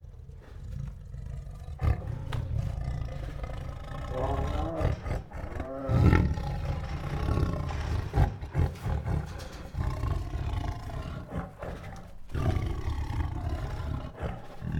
Restless with Growls, Huffs, Moans and Roars, Tigers; Sound Ideas